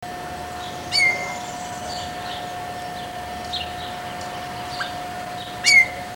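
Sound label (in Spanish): zorzal chalchalero, aves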